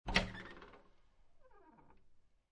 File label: Household, Door Open, Squeaky, Wooden, Flimsy Door; Creaky Door